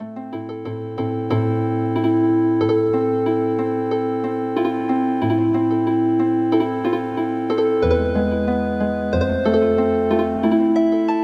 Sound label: Healin Harp; traditional